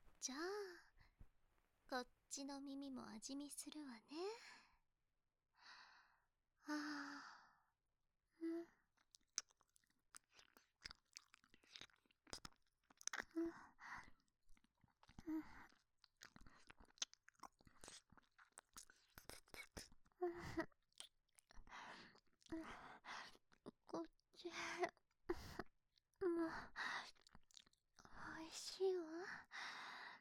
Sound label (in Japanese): 左耳舐め, ASMR